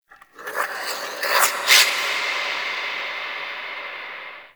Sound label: Under City Small Creature